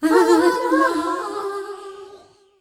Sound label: WATER TURTLE